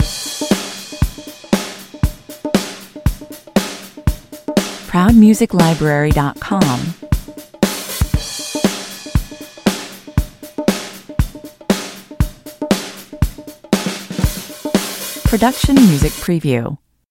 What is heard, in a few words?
loop drums and percussion